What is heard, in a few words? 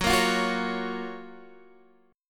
F sharp Major 7th, Guitar, Standard; strummed; JGuitar